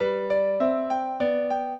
piano; Mozart

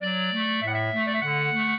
clarinet; Mozart

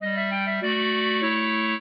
trio, clarinet; Mozart

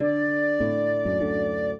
minuet, flute harp; Mozart

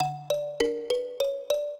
minuet, mbira; Mozart

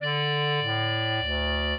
clarinet; Mozart

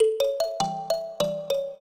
trio, mbira; Mozart

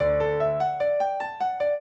trio, piano; Mozart

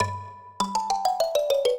minuet, mbira; Mozart

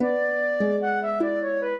minuet, flute harp; Mozart

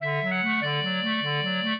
minuet, clarinet; Mozart

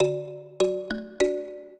minuet, mbira; Mozart

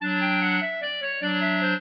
clarinet; Mozart

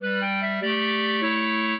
clarinet; Mozart

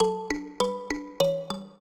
trio, mbira; Mozart

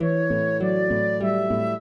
trio, flute harp; Mozart

